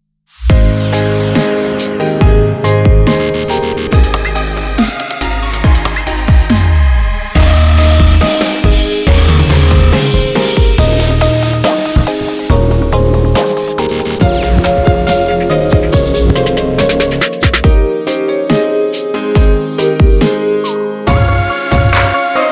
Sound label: DUBSTEP CHRISTMAS